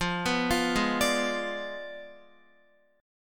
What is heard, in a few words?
F Diminished 7th, Guitar; arpeggiated; 4F,4A,5C,5G,5C,6E; x,8,11,10,8,10; JGuitar